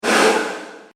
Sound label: Strong Air Speedy Swoosh; Whoosh Sound Effects